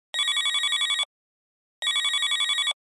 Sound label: telephone early cell